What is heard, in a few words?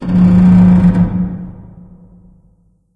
metal randoms, ambience; source engine; portal2